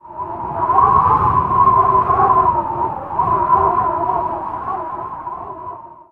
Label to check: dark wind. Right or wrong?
Right